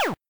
player shoot; sky knight

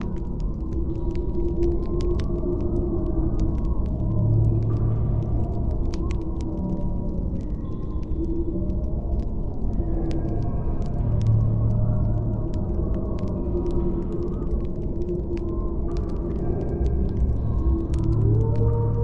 ambient sounds, druid; Heroes of Holdem

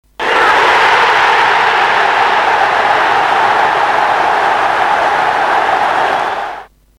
yeah; stadium

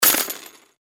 wiin

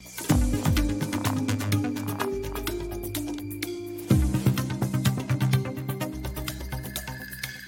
jackpot Music Loop

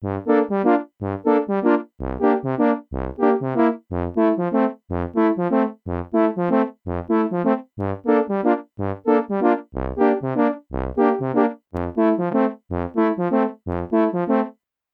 CHORD

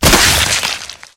crawler impact

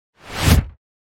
swoosh

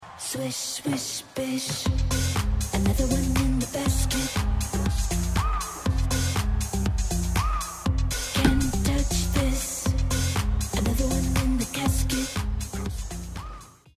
music

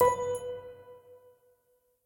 wood chip minor fast

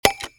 button Gen Click; slots; ui

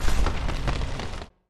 earth Quake; Tower Crash

